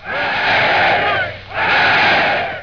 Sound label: men cheer